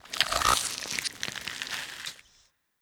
Revive; Skell Revive8

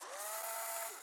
Camera Print; Sx Camera Print Norm 03

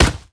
Drone; rabbids multiverse; iOS, macOS, Mobile

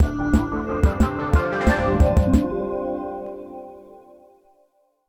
Battle Outro; Dungeon of the Endless

